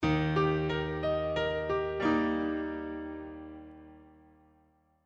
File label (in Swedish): Tonartsgivare D Eb